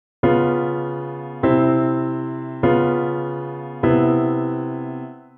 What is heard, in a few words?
Harmony IIb7 I IIb7 Ima7